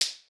Smack; Treasure of Nadia

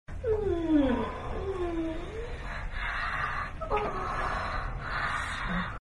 moan, moans; The Witcher Hunt

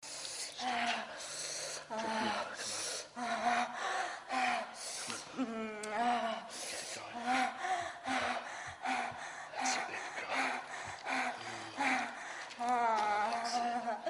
moans; The Witcher Hunt

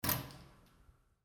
open; Treasure of Nadia

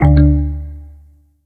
Error; Treasure of Nadia

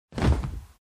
fallman; The Witcher Hunt

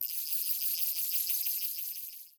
Fishing Reel; Treasure of Nadia

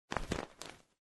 cloth away; The Witcher Hunt

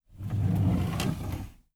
open drawer; Treasure of Nadia

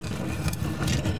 Rock; Treasure of Nadia

